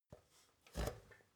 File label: step ladder metal scrape single